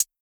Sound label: hat tight, Closed Hat; Hip-Hop; Drum One Shots; SD CAICOS Hip-Hop Sample Pack; Slate